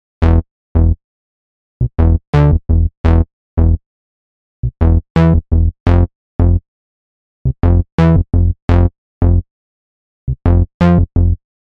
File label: bass